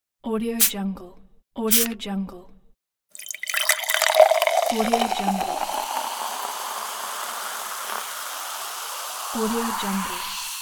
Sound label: soda